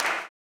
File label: CLAP, blues & soul; ILIO; Vocal Planet